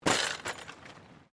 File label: Bicycle Mountain Bike Bounce Gravel, Bike being thrown around to simulate crash impacts; Bicycles